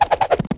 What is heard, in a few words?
die; MEDJAY